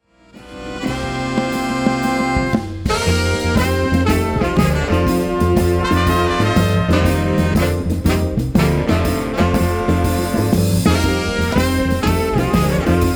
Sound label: shuffle